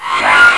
electric, tools; Easy Personal Web Page

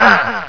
Sarge Noise; BOOM

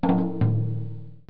logoff; zoc400wg

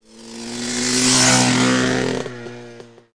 PAGE OPEN

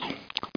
YAWN; dogz

